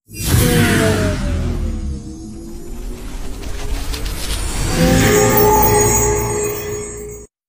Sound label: transition; portal